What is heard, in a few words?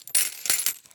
Coins